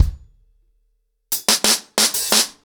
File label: ACOUSTIC DRUMS, 90 BPM